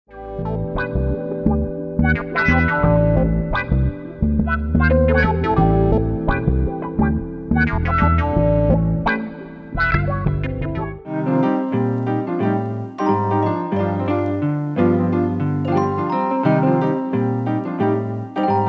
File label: Classic Soul Samples For Hip Hop Beatmakers; Soul Soup Samples vol.1